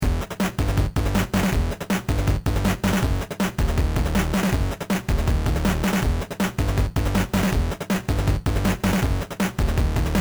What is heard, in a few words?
8-bit